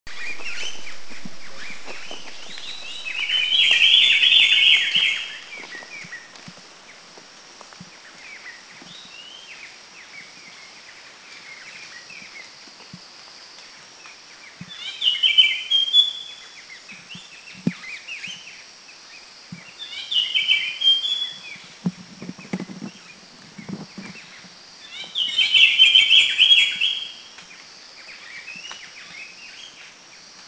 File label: Spangled Drongo Bohol; Spangled Drongo Dicrurus bracteatus; Rajah Sikatuna; Exceptionally nice sounds from these birds on Bohol.